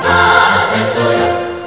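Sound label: lemmings ship explosion; lemmingoids; bship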